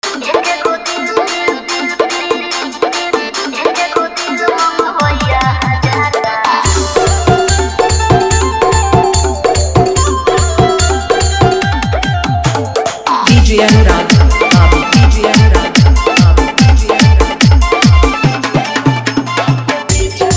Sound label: Bhojpuri Hard Bass Mix; Marikpur